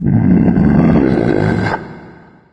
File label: mancubus sight; doom3